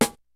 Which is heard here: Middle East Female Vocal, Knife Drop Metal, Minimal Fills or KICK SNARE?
KICK SNARE